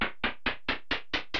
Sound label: stairs up start; zsdx